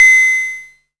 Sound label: OC-SQ BE C5, SYNTHESIZER